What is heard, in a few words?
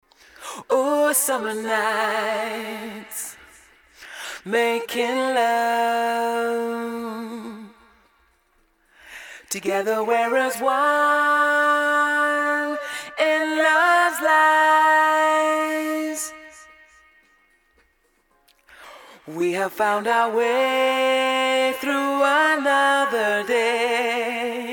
Acapella